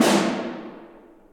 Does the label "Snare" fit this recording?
Yes